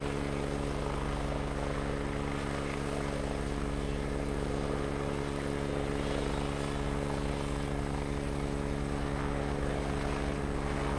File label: agusta a109 ext idle take off away; double ms; sonniss gdc